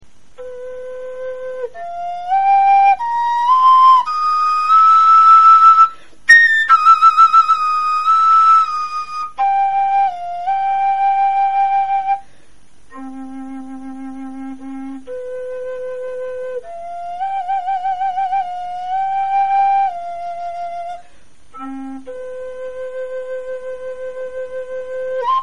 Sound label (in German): obertonfloete